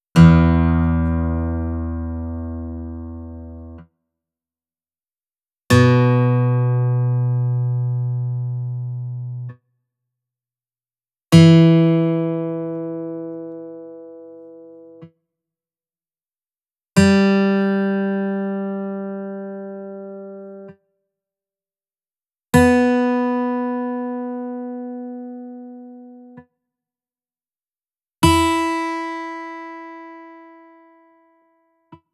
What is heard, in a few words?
Open E minor, guitar